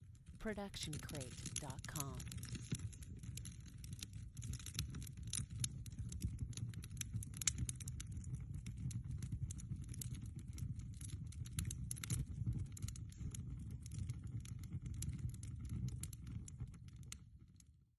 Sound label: Indoor Fire; SoundsCrate